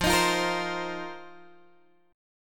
F 7th Suspended 4th F down Guitar Open G

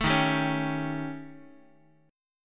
D sharp 7th Flat 5th, Guitar; D#7b5; strummed

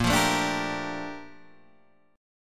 A sharp Major 13th, Guitar Standard

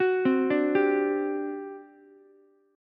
G Major 7th Suspended 4th, G, down, Ukulele; GM7sus4 arpeggiated; JGuitar

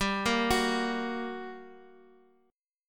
G Minor Major 7th G down Guitar Half Step Down

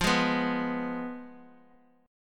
F Diminished Bass Standard; Fdim strummed; JGuitar

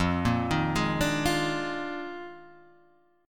D sharp Major 7th Suspended 2nd Suspended 4th F

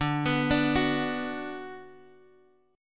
D 6th D down Guitar Drop D, chord

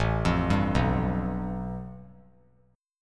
A 7th Suspended 2nd Sharp 5th; A7sus2#5 arpeggiated; JGuitar

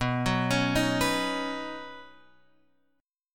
B Major Add 9th, Guitar, Open F; arpeggiated; x,6,6,8,6,6; JGuitar

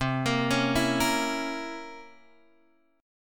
C 7th Flat 9th C Guitar Open G

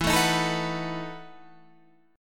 E Major 7th Suspended 2nd Suspended 4th; strummed; JGuitar

